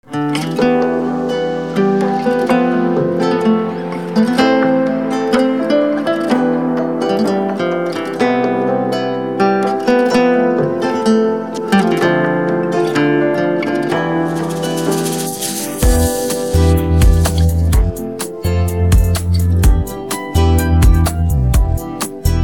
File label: instrumental; persian